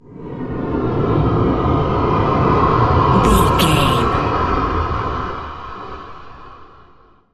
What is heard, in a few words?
dark portal wind effect